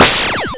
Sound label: PHASER; psion cd vol1